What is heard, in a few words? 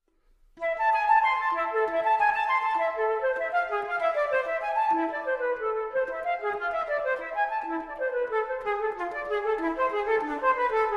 Partita For Solo Flute Allemande